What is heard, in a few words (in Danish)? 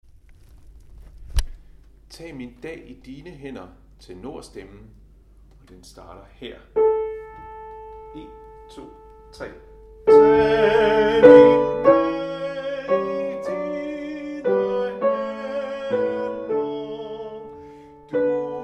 tenor